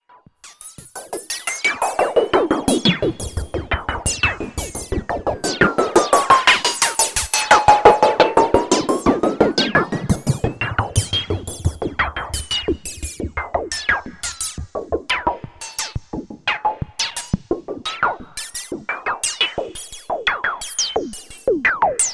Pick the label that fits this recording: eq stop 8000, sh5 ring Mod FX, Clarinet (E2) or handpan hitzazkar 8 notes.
sh5 ring Mod FX